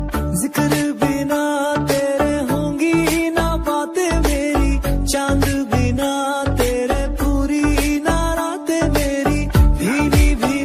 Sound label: instrumental ringtone bollywood ringtone